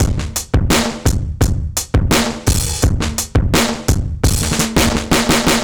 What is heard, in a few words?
Old Drums 85; 85bpm; Drums n Perc; analogue circuit; musicradar